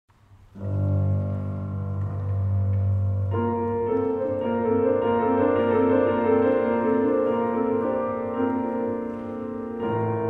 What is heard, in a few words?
Piano Prelude live